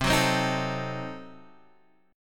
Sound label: C Diminished 7th down Guitar Half Step Down